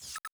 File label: chat declined request; Escape from Tarkov